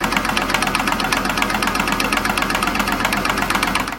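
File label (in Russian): фургон работает вхолостую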